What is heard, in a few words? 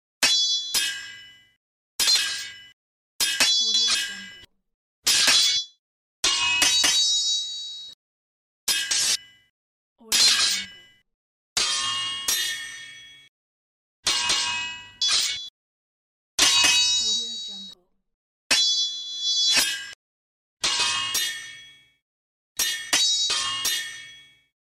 sword fight